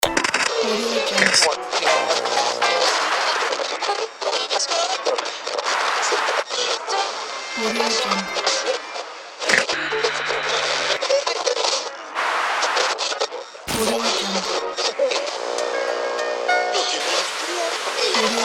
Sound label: radio